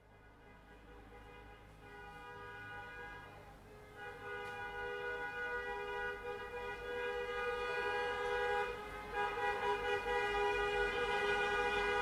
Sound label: Funeral Horns Palas de Rei